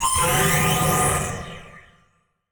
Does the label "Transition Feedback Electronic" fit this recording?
Yes